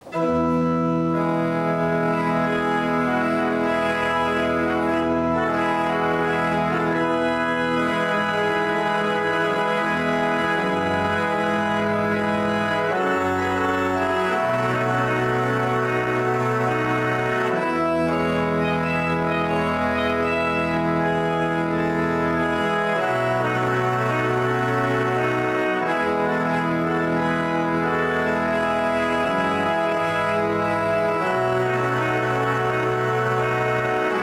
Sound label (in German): orgel; Goebelorgel